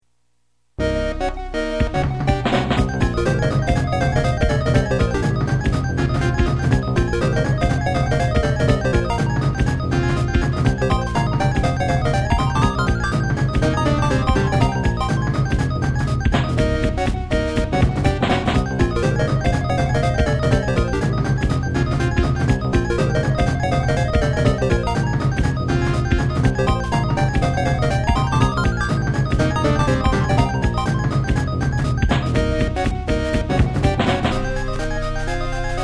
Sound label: techno tower; robotnik; 1995 knuckles chaotix